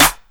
Snare HipHop; DrumDigger; pitchglitch

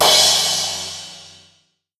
Crash Short; pitchglitch

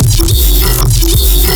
Sound FX Space

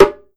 Perc Djembe Tone; Circ Afrique V4

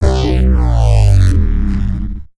Low Laser Dwnshift

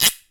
Perc Guiro

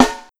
Snare Jungle

